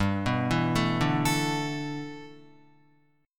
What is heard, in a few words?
G 6th Add 9th G Guitar, chord; G6add9 arpeggiated